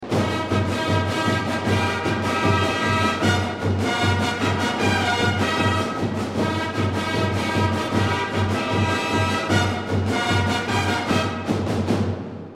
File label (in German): Fanfarensound